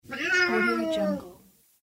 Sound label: cat angry; nerso-77